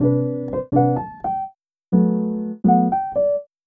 piano, vol up version; ゆめ2っき

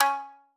marimba shamisen; ゆめ2っき

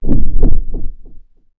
heartbeat

